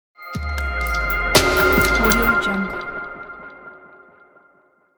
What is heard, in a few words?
short glitch logo